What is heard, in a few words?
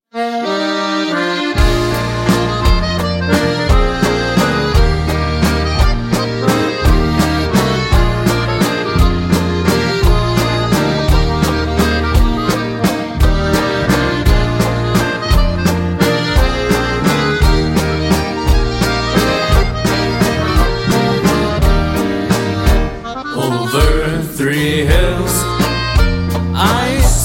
Over Three Hills Waltz